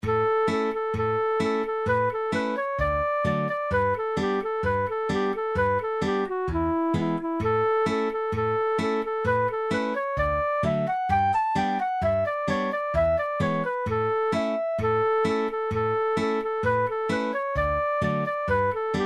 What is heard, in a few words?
Salt Creek 65bpm